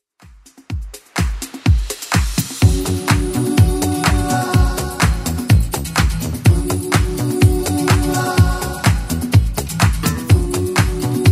80s Club Edit 125bpm

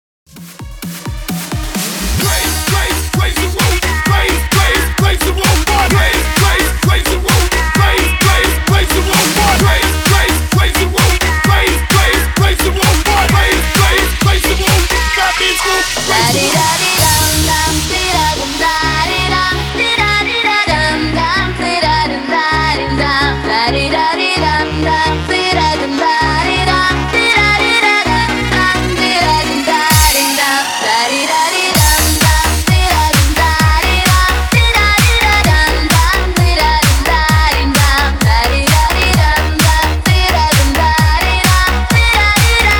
DANCEHALL 130 BPM

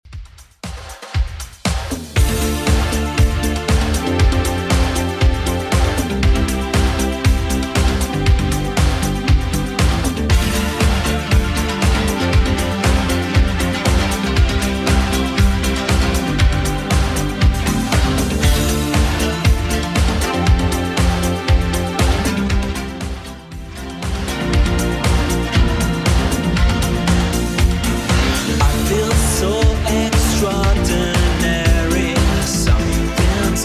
90s 118 bpm

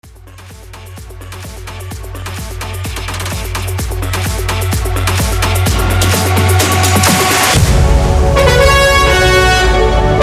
Epic Synth Banger 128 BPM

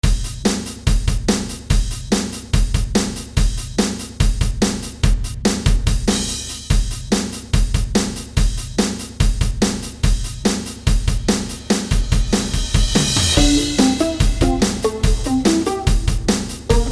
Rock Remix

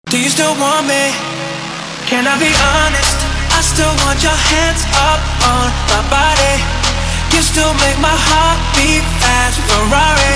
Club Party Banger

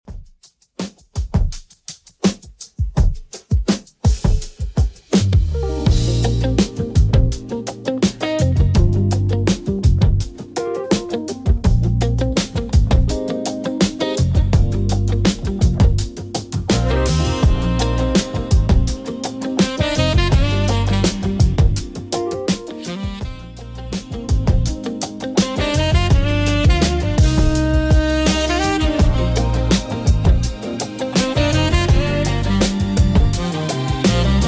70s Redrum 83bpm; remixplanet

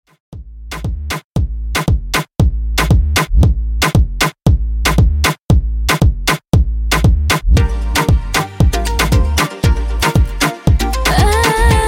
Moombahton 116Bpm; remixplanet

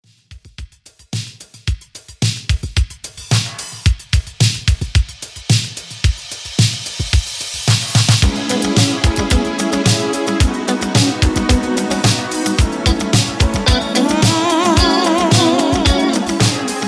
80s Redrum 110Bpm

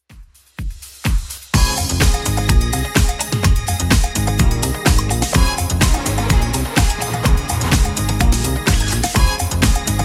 80s Redrum 126bpm

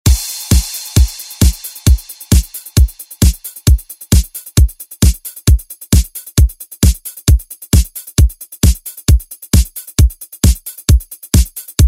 Re Drum

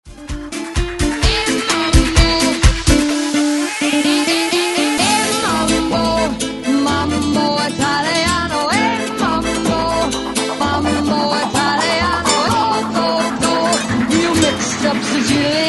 128 BREAK MIX; DJDOLLS

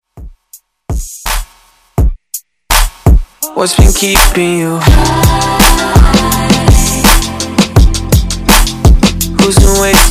Top40

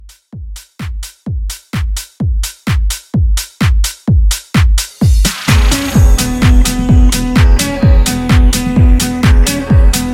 Disco

